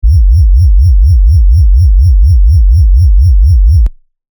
Bass

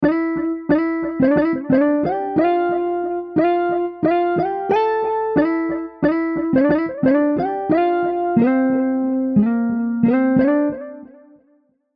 F 80, Soul MIDI Melody; Shells

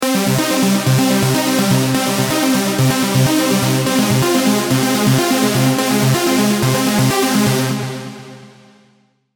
Cm 125, Techno & Melodic Techno MIDI Melody; Coast

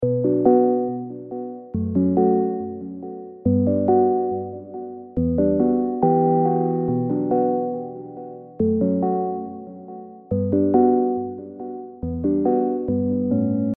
Bm 140 BPM, R&B MIDI Melody; Tease

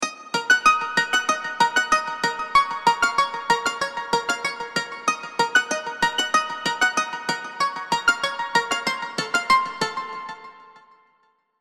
Bbm 95, Latin & Reggaeton MIDI Melody; Mimic

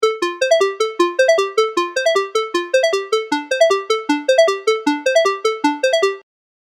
Future Bass Melodic Trap MIDI Melody, F, 150; Proper

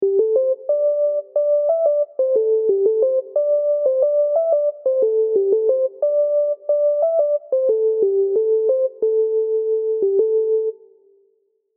Am 80, Soul MIDI Melody; Bypass

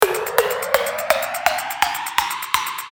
FILL; UNISON

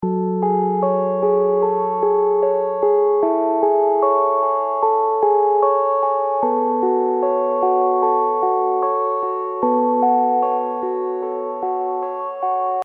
F#m 180 BPM, Hip Hop & Rap MIDI Melody; Hip Hop & Rap MIDI Melody Collection

